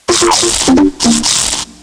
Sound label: bop be doo